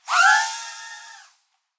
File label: leisure drone toy small nano take off away